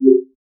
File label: cozy game menu option